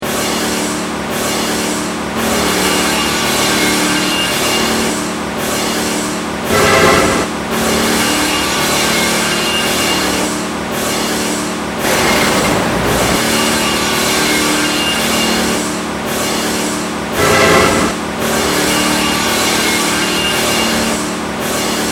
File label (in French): industriel